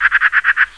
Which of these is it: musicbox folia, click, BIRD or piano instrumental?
BIRD